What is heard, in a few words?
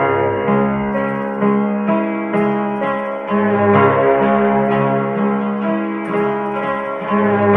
piano, Loops Sad